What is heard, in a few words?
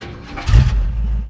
alfa on; Mafie 1